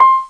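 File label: electric piano C4; Sound Library 2000; Wayzata Technology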